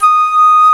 flute D#5; E-MU Formula 4000 Series Vol. 5; Protozoa; Proteus2 Presets

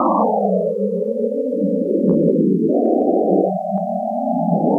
Wind Vane

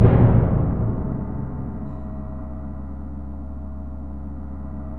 PRC GRONG, Grongkas

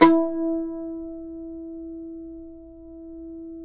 CUMBUS, OUD; Roland - LA Composer vol. 3

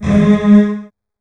DOUBLE BASS

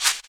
Shaker; Equipped Music; Loungin House

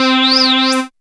Dance Synths, Acid Bubble, c

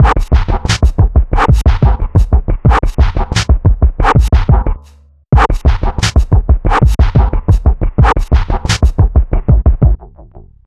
slap 090bpm; Zero-G; Downbeat Dance Grooves

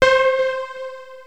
Access Virus B; Synthworx

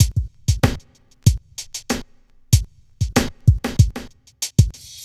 drums; Sonic Foundry (Sony Creative Software) - Stylus Pressure Urban Grooves on Digital Wax; Chillin Out

